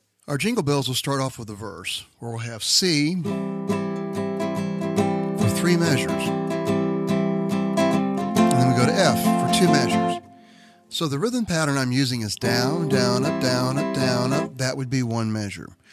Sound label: Strum Along Christmas Bari